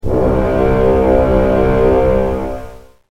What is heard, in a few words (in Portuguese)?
NAVIO